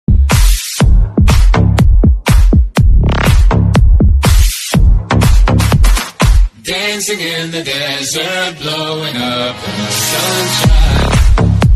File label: Mobile Ringtone